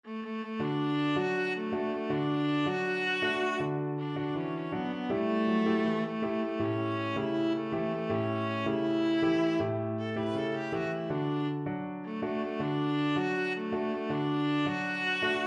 viola

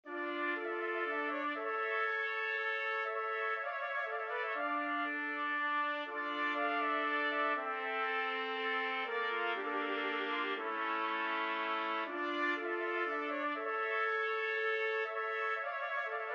concerto grosso 4TPT, trumpet quartet

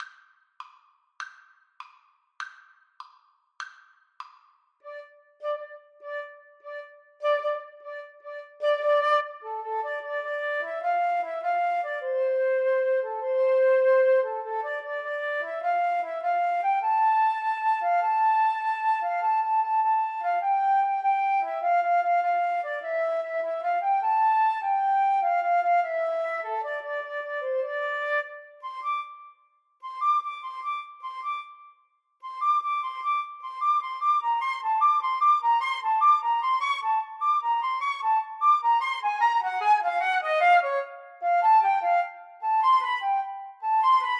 when johnny Flute Clarinet duet

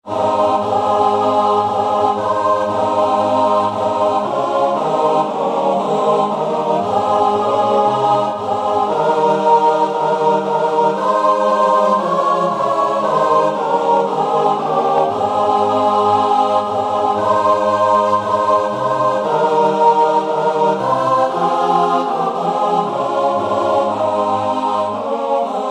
boundless salvation CHOIR

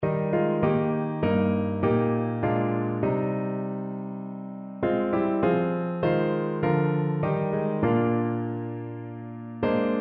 my fathers world, piano

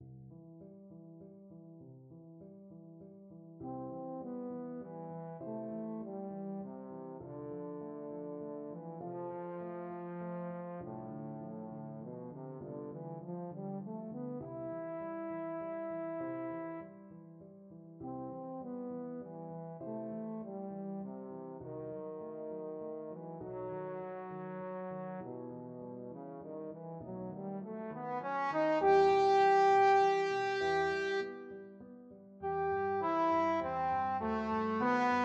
swan, trombone

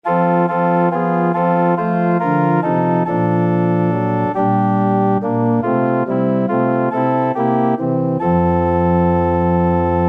turn your eye, organ

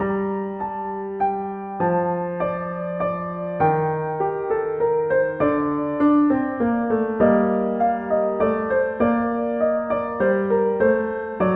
bach minuet in g minor piano; bwv anh 115

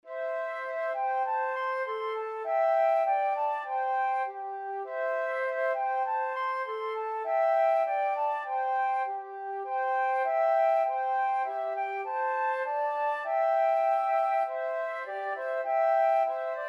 jasmine flower, flute duet

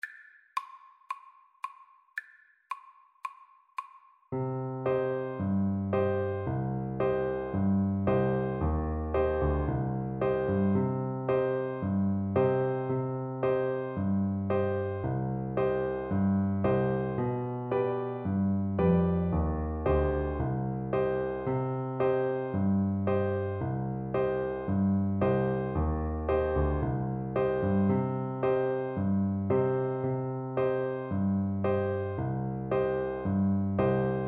syrtos simiotises, Trumpet

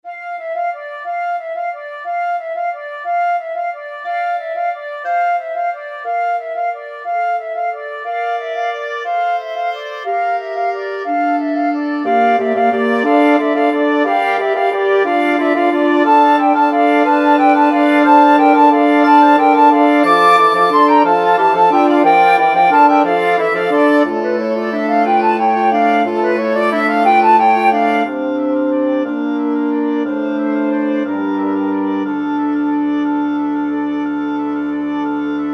carol of the bells, wind quartet

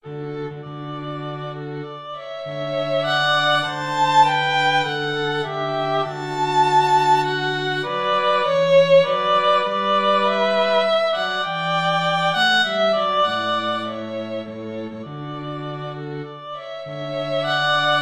2 violins cello

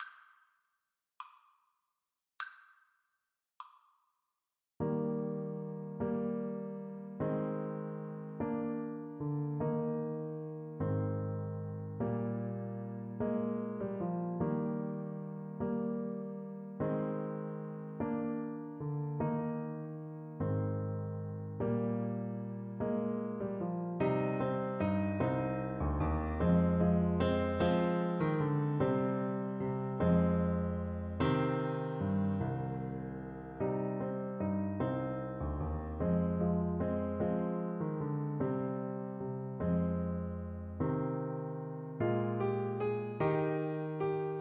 skye boat, Trumpet

